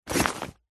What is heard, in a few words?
bag sports small pick up; zapsplat